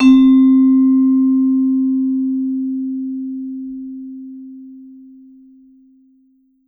vibe med ff C3, Marimba & Vibes; Sampleheads; Dave Samuels

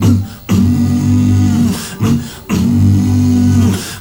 MALE HUM, M. HUMMERS; Spectrasonics; Heart of Africa

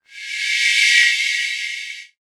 X MACHINES, Production Effects; Best Service - XXL Production Effects

